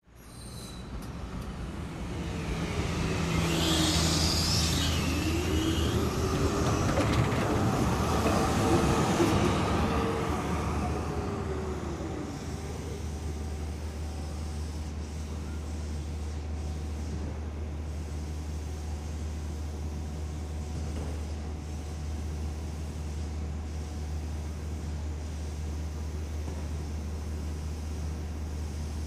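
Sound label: City Ambience, el trains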